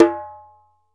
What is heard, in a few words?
ASHIKO, African Perc